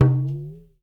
TALK DRUM, ETHNIC DRUMS; Spectrasonics; Liquid Grooves 1 & 2

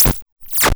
Duelling Impulses; Cwejman S1; DevSnd Cwejman S1 - JK

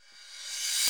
FEAR FX; X-Static Goldmine 2

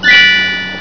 Ring; warlords; ST-XX Amiga Soundtracker Sample Packs

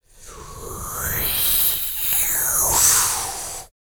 VOX FX, BREATH FX

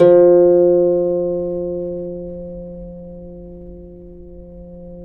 CEL HARP F2; USB Soundscan vol.23; Historical Instruments; AKAI

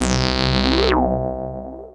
Anasound Long mid C, Analog Single Notes

